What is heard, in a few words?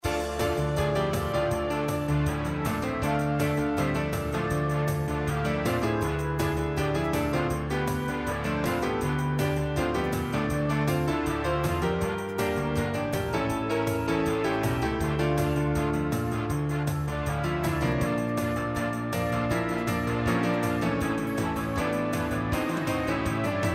Rock Sonata